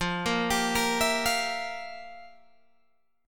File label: F Major 7th Suspended 2nd Suspended 4th, F, down, Guitar, Open G